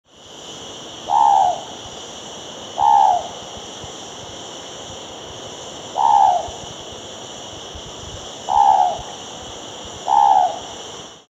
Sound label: ninox affinis